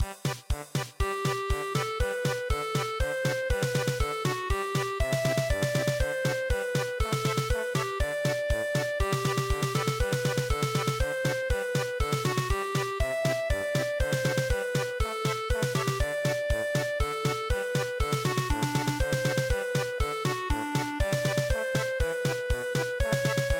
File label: polka